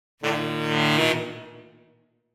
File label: Horn Section Criminal Increasing Lower; Hollywood Edge; sound designer's toolkit v2